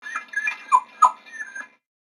Pet Dog Robotic Idle; creatures; pets; Lego

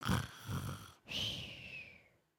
Sleep, creatures organic; Lego; Strombie

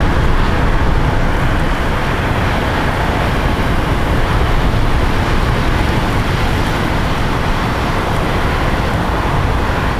Waves; Pet Rock; ambience pet rock

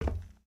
Foot Steel Boot Walk, footsteps

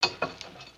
Chest Idle

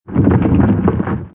Lava Rumbles

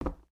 Foot Wood Boot Walk, footsteps

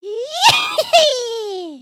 dance; emotes

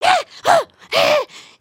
panic, emotes

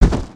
Pet Dragon Step Sweetener; creatures pets

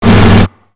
Robot Air Flail; Lego; creatures mechanical

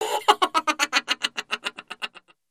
minifig laugh

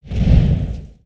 Spinjitsu Fire One shot

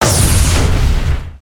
Plas Blas; foley

